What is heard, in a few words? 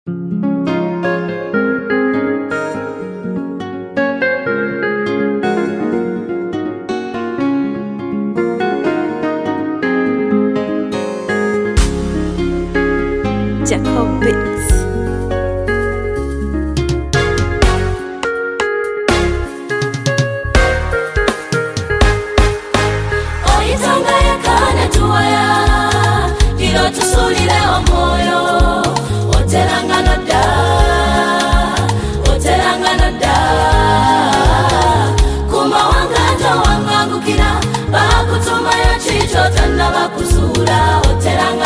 Choir